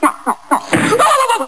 Slam; Nyuk

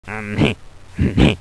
Chuckle

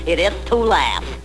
It Is To Laugh

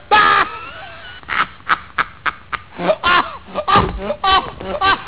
belly laugh

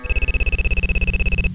Cell Ring

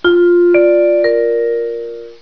NBC Chimes

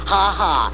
Haha

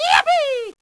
Yippie

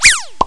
whiz pop

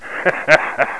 short Laugh

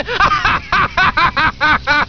Laugh

